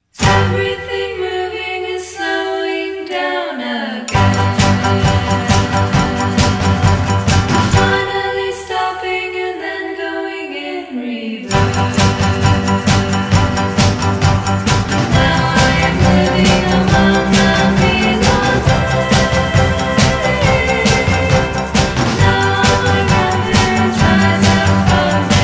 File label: triplets; bodies of water